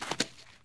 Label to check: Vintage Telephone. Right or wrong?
Wrong